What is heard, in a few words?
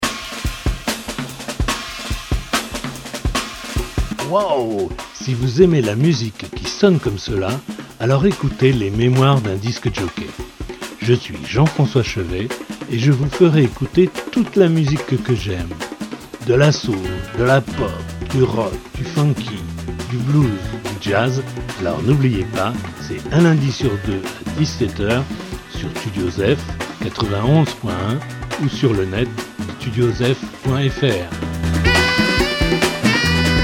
jingle